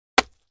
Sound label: Arrow Body Short Quic